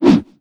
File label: Robot Toss; Nicktoons Nitro; arcade